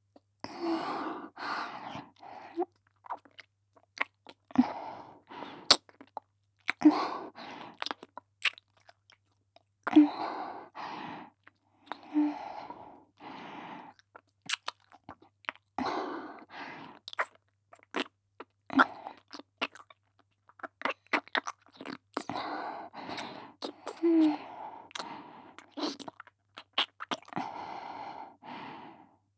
耳舐め（ねっとり）; 【甘々媚びアクメ】性欲旺盛な陸上部の先輩後輩JK、ベロチュー青春溺愛えっちでコーチの汚ち◯ぽ生ハメ特訓♪

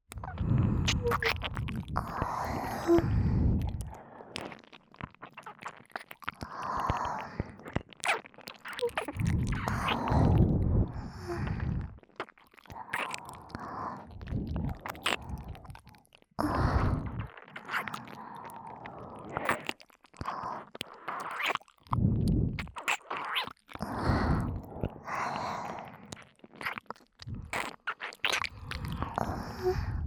反転 たっぷり両耳舐め; みみなめぇ～る

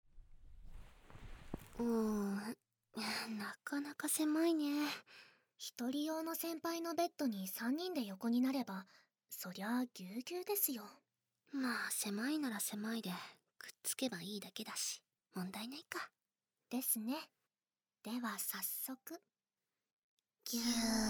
ダブル耳舐め 手コキ SE大, ASMR

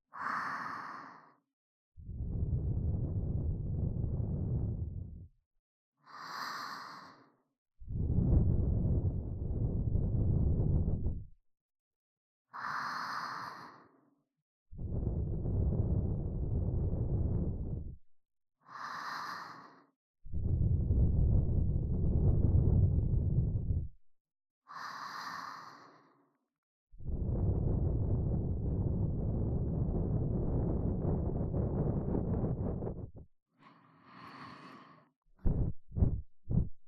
【右】耳 ふー; 耳かき小分けトラック

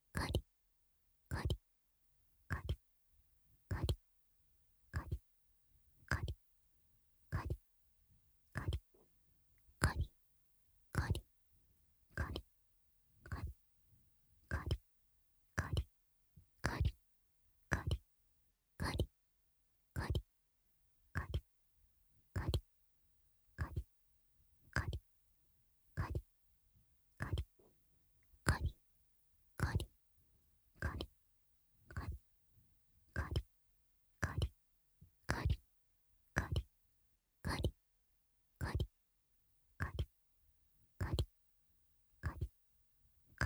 乳首弄りループトラック編（乳首ひっかき用）, ASMR; 超初心者のための「3ステップ」乳首開発完全ガイド!～低刺激スローチクニ―から♀イキまで～

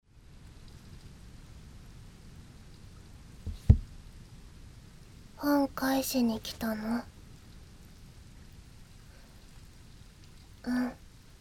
雨の図書館で耳舐め【BGMなし】; 宮村さんはいつも眠たげ; ごりごり耳舐めASMR・ささやき

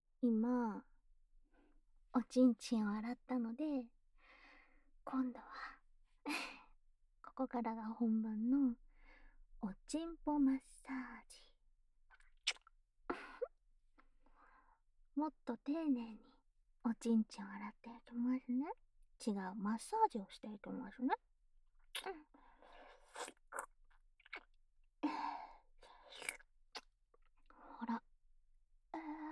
お口でおちんぽマッサージ, ASMR

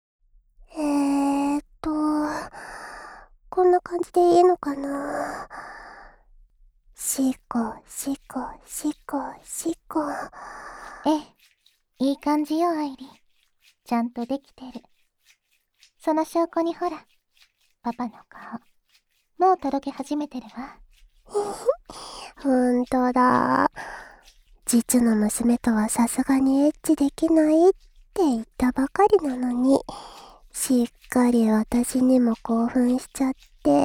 W耳舐め 手コキ SE大, ASMR

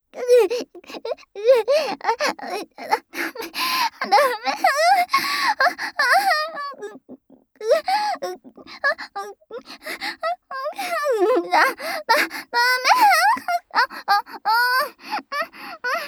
催眠解除ダメダメ喘ぎループトラック; スマホ催眠

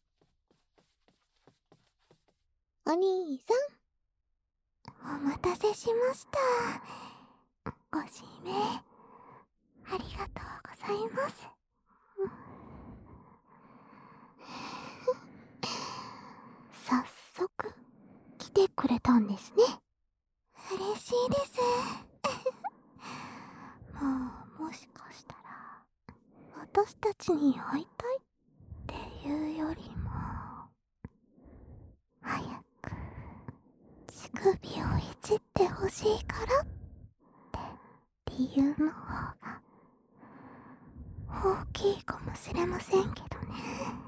反転 両耳舐め; 乳首弄り; お耳密着型☆コンカフェ『みみなめぇ～る』へようこそ♪

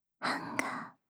ハンガー, ASMR; シャッフル睡眠ランダム再生用; 無声音ASMR; シャッフル睡眠法で眠ろう; バイノーラル音声

